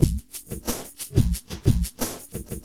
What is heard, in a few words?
HipHop Backbts; LiveAct Pool